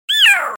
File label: OOOHHH